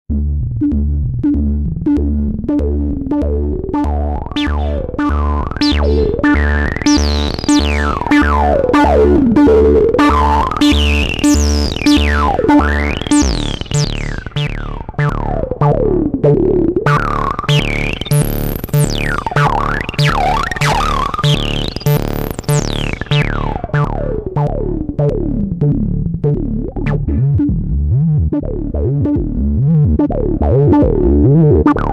waldorf microwave xt 303 external fx

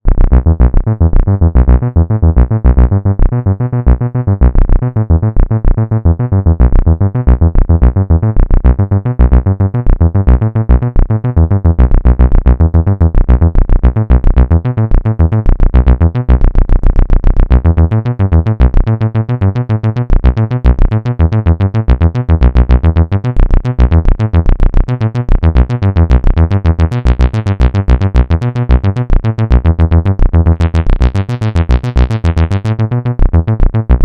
yamaha tx16w sounds 1xsawth; Yamaha TX16W with Typhoon 2000

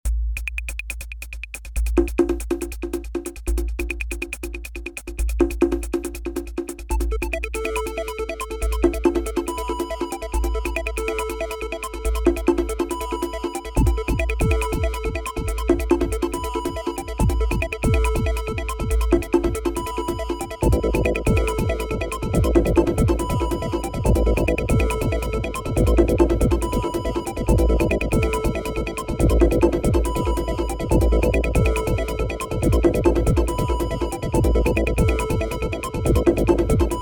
evolution evs-1 sequenced external fx

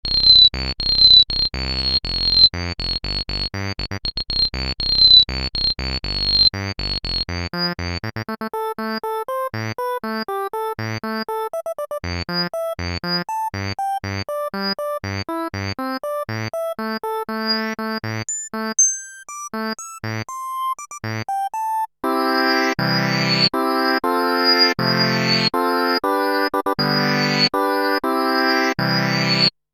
filter, rez 12 pole; e-mu virtuoso 2000

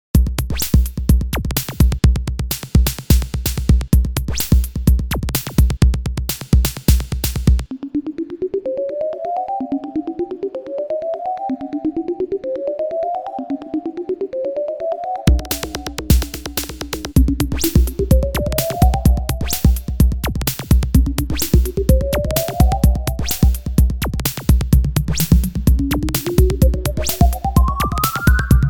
quasimidi sirius elektro; factory demo

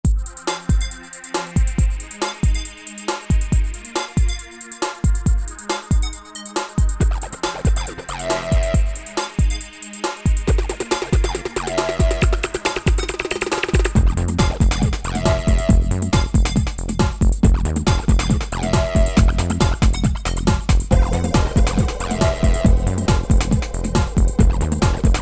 e-mu virtuoso 2000, beat pusher; factory demo

